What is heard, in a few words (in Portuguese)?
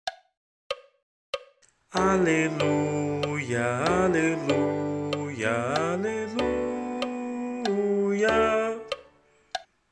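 Aclamacao coro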